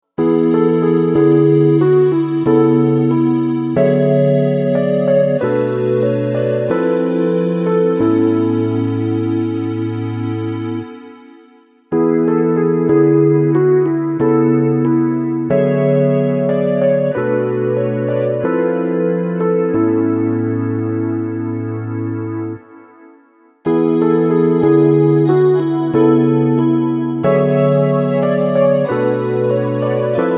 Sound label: Epno plus synth pads; layering digital keyboard sounds